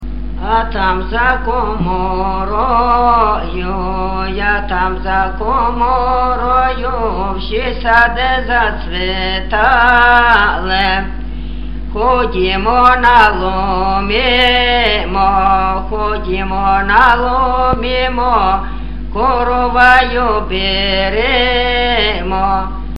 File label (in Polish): A tam za komoroju; Orchowek